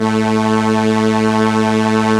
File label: Strings Arp SE A3, ARP Solina